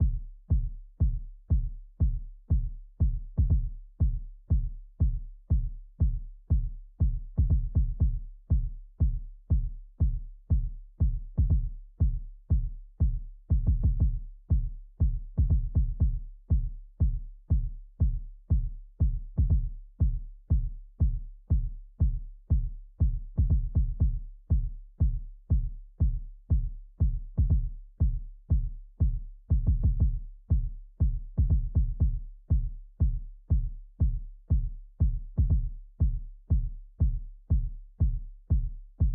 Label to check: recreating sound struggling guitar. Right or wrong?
Wrong